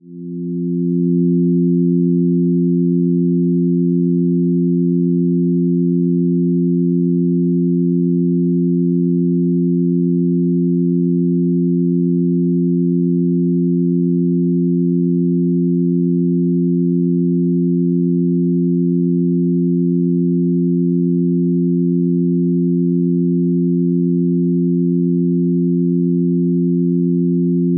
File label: music spiritual soft